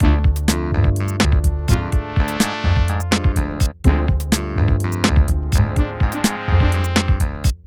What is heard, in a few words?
bombo groove; bombogroove 10